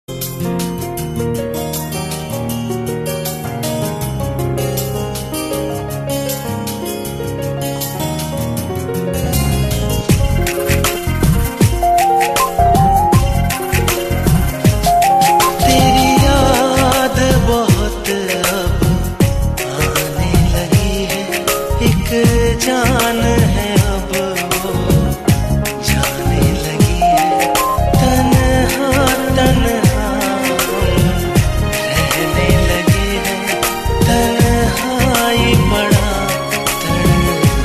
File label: Bhajan Ringtone